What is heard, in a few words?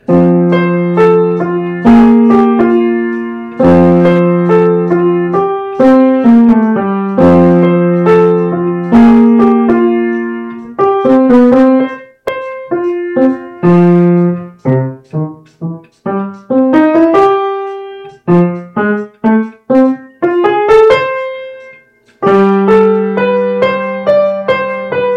jazzy tune